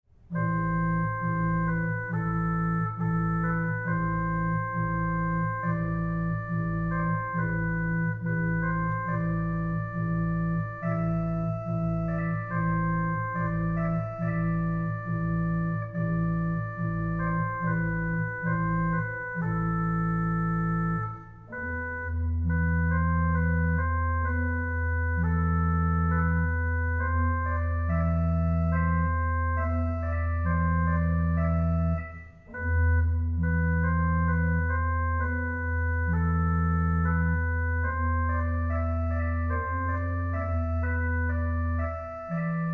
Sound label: Chant Arabe, Orgel; Orgelskola I